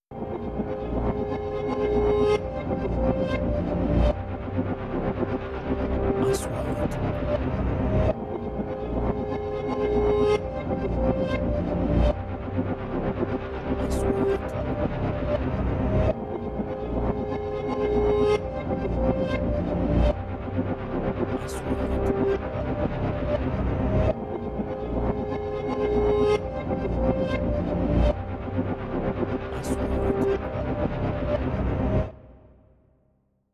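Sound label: 3D SFX Trailer; مؤثرات تشويقية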